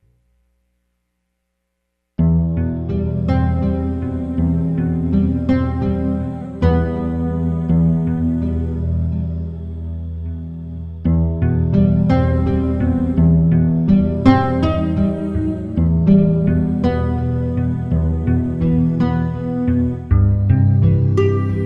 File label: Eight Tone Triadic Cluster 4 6 5; tuning survey